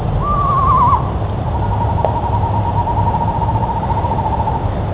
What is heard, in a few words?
screech owl